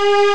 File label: string; lemmings; Amiga Soundtracker Sample Packs